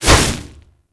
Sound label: Fist Whoosh; Warframe; Mummy